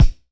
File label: Pound, Jomox Kicks; Bionic; Airwindows; Dimensional Jomox Kicks